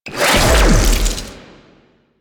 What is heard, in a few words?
Beam Shot; Weapons; Warframe; Archwing; Larkspur Prime